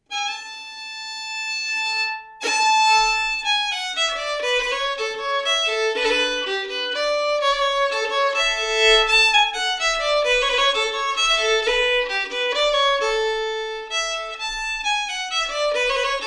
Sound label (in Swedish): vals; efter spaken